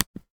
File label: Click On A Macbook Air Trackpad